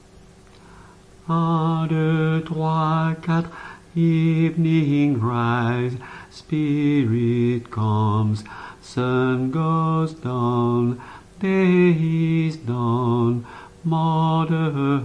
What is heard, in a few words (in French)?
basse, chorale